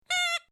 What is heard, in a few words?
Funny Rubber Honk; Cartoon / Comedy / Silly little horn or rubber like horn honk; Miscellaneous Cartoon Sound Effects